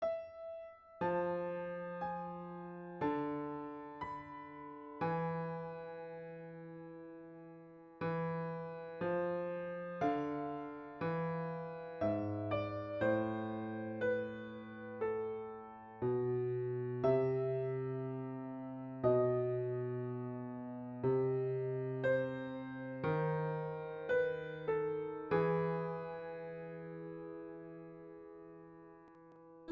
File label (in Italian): Melodia; Languisco e moro